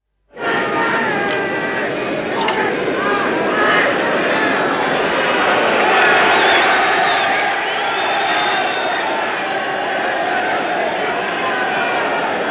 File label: Public Demonstration in a London square, surge of angry crowd; BBC